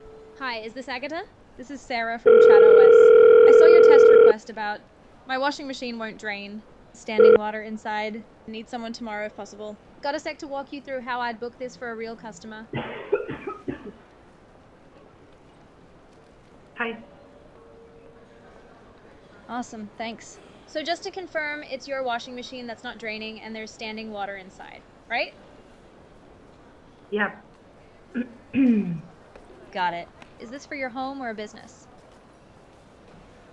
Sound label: washer drainage